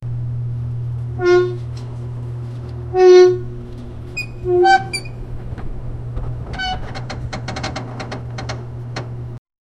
creaking saloon sign, western

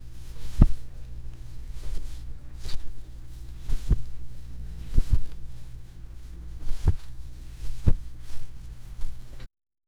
light fabric movement sou, cloth

